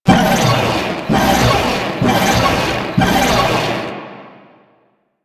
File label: Purification Machine Charge Loop; Warframe; Nineteeen Ninety Nine; Infested Survival